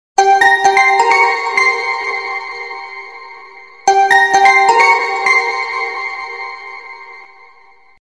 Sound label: Samsung Galaxy Note 20 Ultra Ringtone